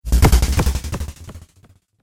Trailer Hit Rising Delay

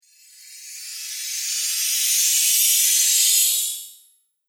Diamond Whoosh; Whoosh Sound Effects

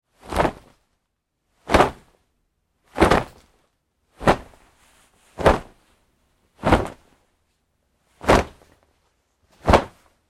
Foley Fight Moves Jeans Singles Hard; Wrestling And Struggling

